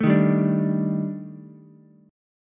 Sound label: D sharp Major 7th Flat 5th, down, Ukulele; strummed; JGuitar